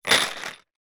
industrial drywall screws put in empty plastic box